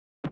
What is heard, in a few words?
Flip Grenade; Worms3D